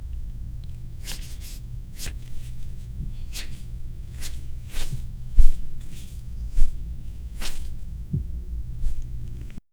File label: arms and snatch up